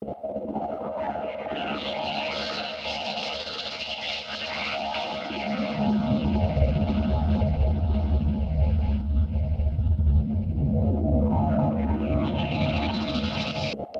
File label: 140bpm 303 swirl